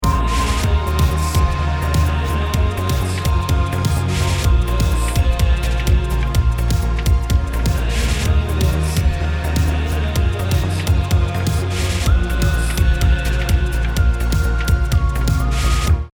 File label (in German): ohne Compressor